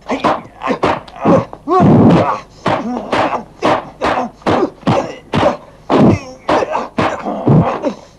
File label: martial arts